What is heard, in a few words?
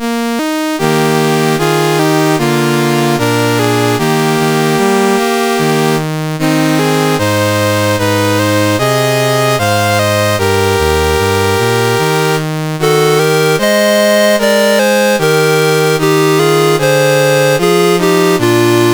CSG8580R5, National Anthems; Sabah